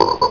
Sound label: FROG; DUCK